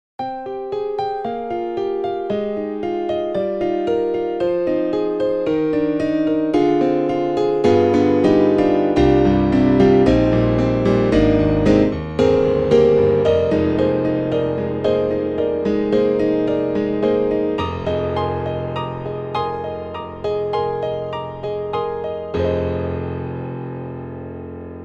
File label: Grand Piano 1 + Keys; Steinberg HALion 3; ECO